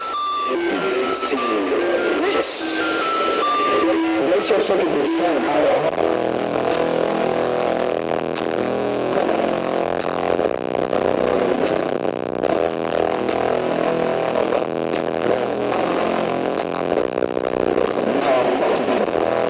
earrape

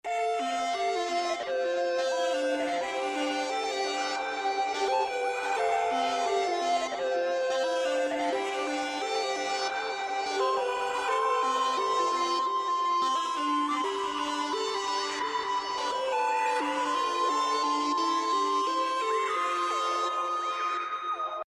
Migraine Synth

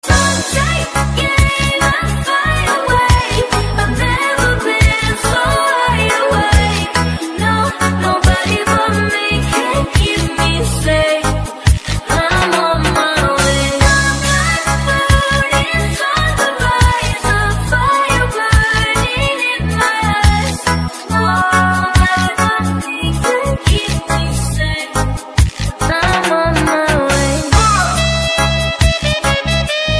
Reggae

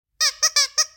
Squeaker

